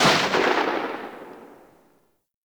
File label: Spark Rifle Shot End; Warframe; Grineer